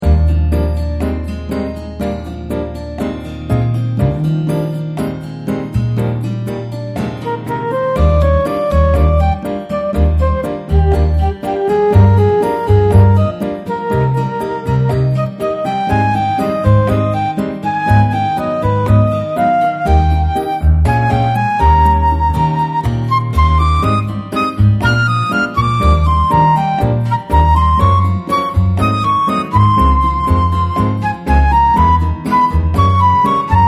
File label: bgm novel high tempo; Goodroid Inc; Android